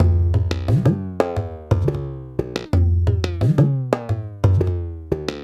TABLAS; Zero-G; Chemical Beats

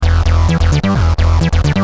Driven, Bass; Industrial

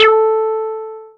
Synths BASS

